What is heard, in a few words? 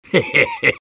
laugh short; sniper